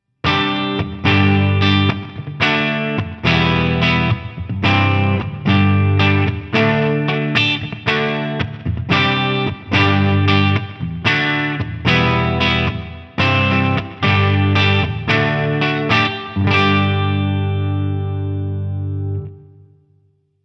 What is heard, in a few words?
Revv 100R Reverb